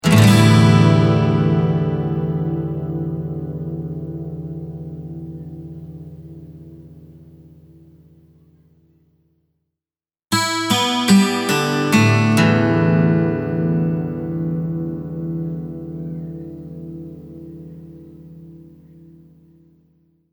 12 String Guitar, Single strum and string picks of a twelve string acoustic guitar.; Guitars (Sound Effects:Musical)